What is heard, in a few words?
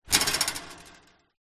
impact metal fence chicken wire vibrate